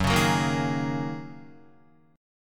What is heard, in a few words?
Gb 6th Add 9th Gb down Guitar; Listen to Gb6add9 strummed